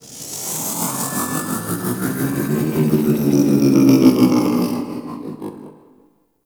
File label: Grungy Drone Passby; Ambience; Warframe; Corpus Railjack